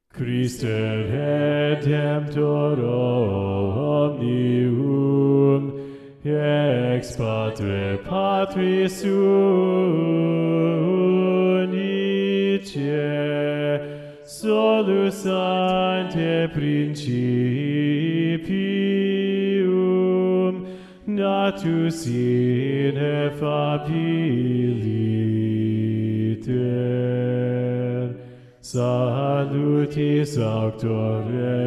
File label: Bass; Christe Redemptor Omnium; Practice Room